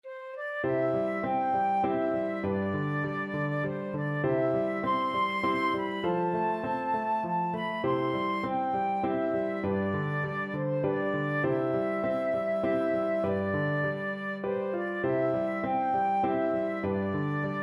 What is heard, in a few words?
man you dont meet, flute